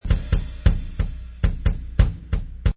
Jazz bass drum and cymbals loop at 180 bpm.; Drum Loops

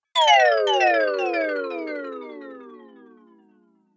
Rpg Magical Spell, Magic Sound Effects; Time Bandit

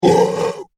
Monster Zombie Growl; Horror; Zombies (Sound Effects:Horror); SFX Bible 6

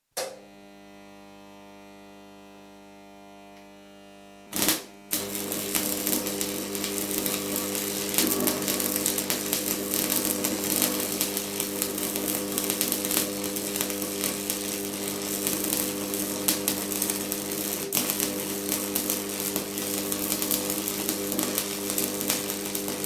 Arc welding machine, switch on, weld off; Welders (Sound Effects:Machines); BBC

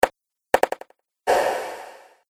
Impacts Dry Delay Reverb; Hit Sound Effects

